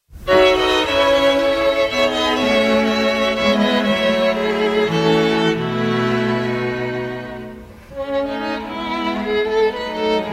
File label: string quartet; Hopkins, Antony; Gaudeamus